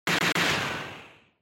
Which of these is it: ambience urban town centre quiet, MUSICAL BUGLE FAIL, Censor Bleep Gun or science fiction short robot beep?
Censor Bleep Gun